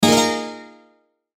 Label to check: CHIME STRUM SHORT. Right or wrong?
Right